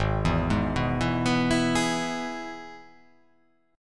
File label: G sharp Minor Sharp 5th